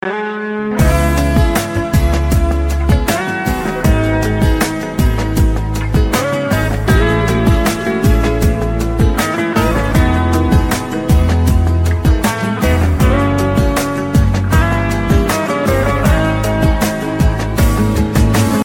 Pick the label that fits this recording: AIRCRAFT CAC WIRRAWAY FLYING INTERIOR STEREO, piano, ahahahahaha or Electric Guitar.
Electric Guitar